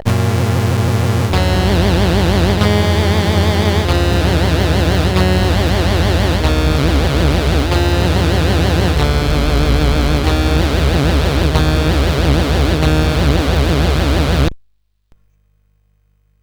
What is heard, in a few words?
Boing, CSG8580R5